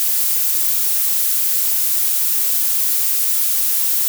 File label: psr noise IRIAA; linearaudio